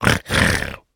猪灵庆祝声

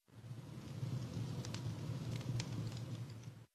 营火燃烧噼啪声

